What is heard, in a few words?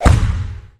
Beach ball attack